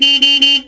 CAR HORN, VEHICLES

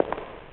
Footstep ECHO

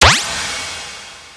FLY GONE

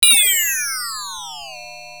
BOMB; ZXFX

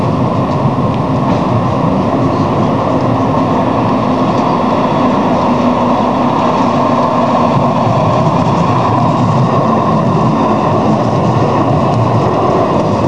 TROLLEY; ENVIRON

